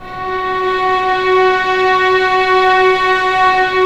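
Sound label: violins, piano; String Master Series; Vlns 6 p-mf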